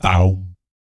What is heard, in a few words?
BS, Vocal BASS FX; ILIO; Vocal Planet VOL-3; Jazz & FX